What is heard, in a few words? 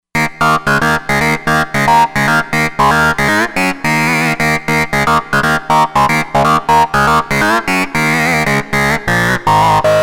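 Lead Dist 5th, synth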